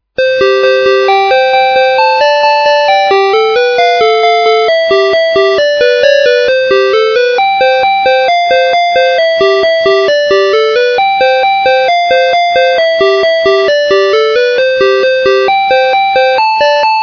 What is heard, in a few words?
Twinkle twinkle little star, doorbell; 门铃音乐芯片/小星星